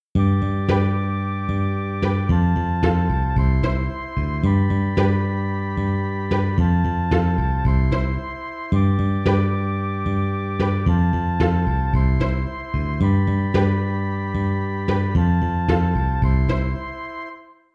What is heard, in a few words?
intro midi